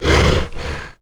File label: alpine predator idle; slender; tera creatures